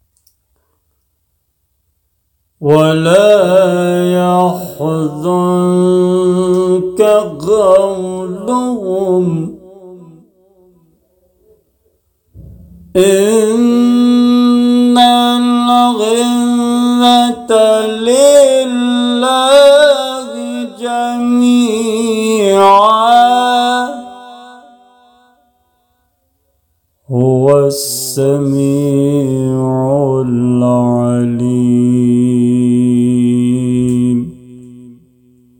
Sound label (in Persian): تلاوت سوره یونس آیه 65; تلاوت و ترجمه تفصیلی قرآن